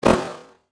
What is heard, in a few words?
retro sound